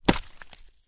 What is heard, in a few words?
limb blunt; Damage; Barotrauma; Subsurface